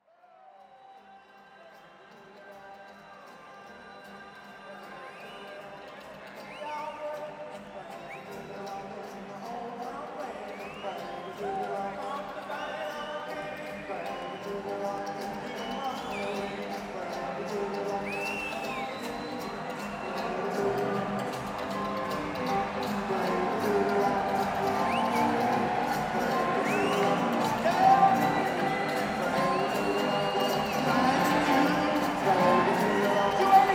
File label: Opening Music